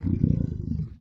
land idle, guardian, mob; Minecraft; Votive World

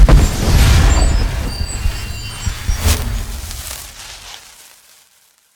use totem; item; Minecraft; Conquest Default

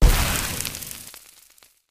player hurt fire hurt; Minecraft; Votive World

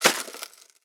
hoe till; item; Minecraft; Votive World

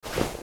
equip leather, armor; item; Minecraft; Conquest Default

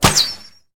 shoot, crossbow; item; Minecraft; Conquest Default

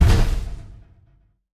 walk, iron golem; mob; Minecraft; Conquest Default

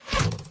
hwacha impact; Minecraft; Votive Content; wows

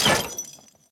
lever; Minecraft; Votive World

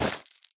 equip chain, armor; item; Minecraft; Conquest Default

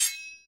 sword rain summon; Minecraft; Votive Content

